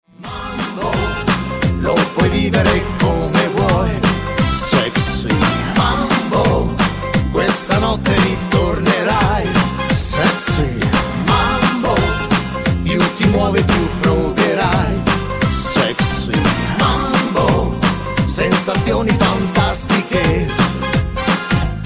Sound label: sexy mambo